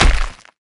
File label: clap; smite; geb